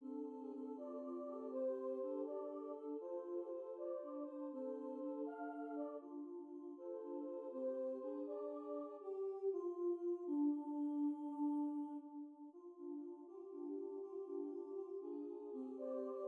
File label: kirie blues